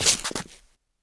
shoe scuff, footsteps; survivor

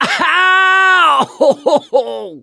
hurt critical, mechanic; voice

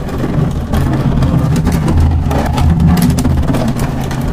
plastic barrel scrape rough loop; physics

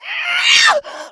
rage, infected, female

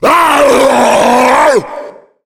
fire, pain; tank

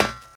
metal, footsteps infected walk; player

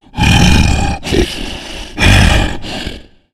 tank growl

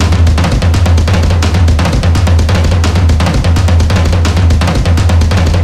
drums; Left 4 Dead 2; zombat; horde

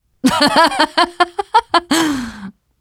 laughter, teen girl

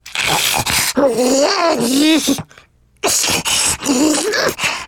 spitter lurk; voice, idle

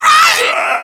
rage, infected, male; npc, action

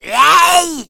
smoker spot prey

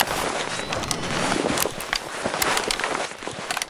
defibrillator use start; weapons

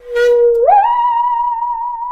saw danger, music, zombat

